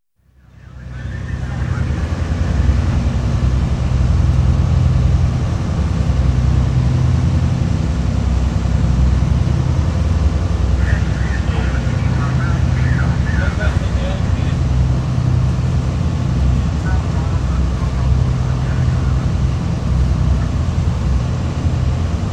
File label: Lifeboat, atmosphere in wheelhouse, with some indistinct speech & R/T (48ft 6in Oakley class self-righting boat); RNLB 'Charles Henry'; BBC